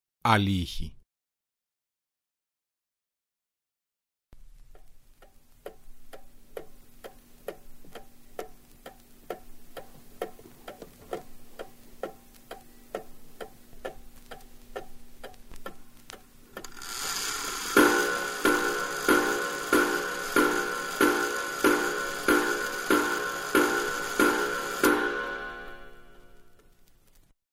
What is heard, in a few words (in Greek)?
Hxoi Roloi